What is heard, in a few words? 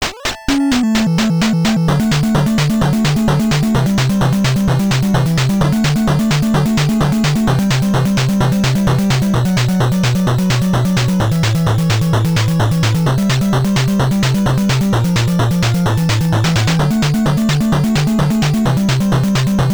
Motorcycle Ride; Pizza Pop; nes